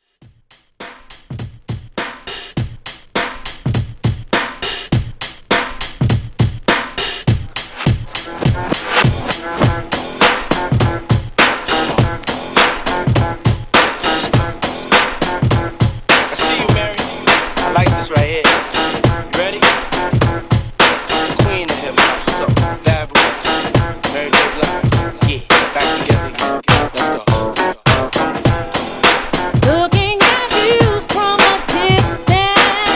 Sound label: Old Skool ReDrum 102Bpm